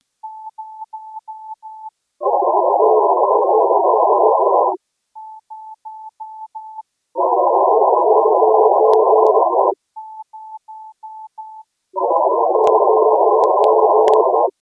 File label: Echoes 2m